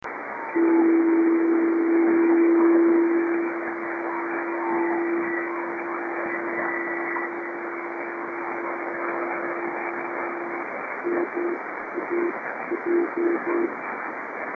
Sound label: driftnet beacons; 26.670